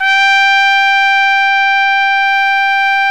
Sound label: trumpet; Best Service Gigapack 1